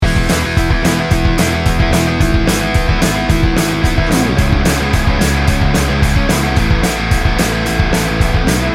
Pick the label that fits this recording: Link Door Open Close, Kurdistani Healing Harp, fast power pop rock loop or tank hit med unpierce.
fast power pop rock loop